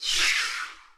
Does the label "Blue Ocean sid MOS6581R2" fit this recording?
No